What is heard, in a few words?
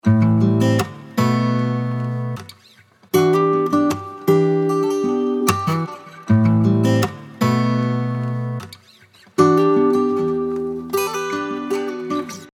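E minor 154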